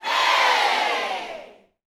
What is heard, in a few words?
"hey", gospel shouts; Spectrasonics; Vocal Planet Vol.1 Gospel; akai; UYC SHOUTS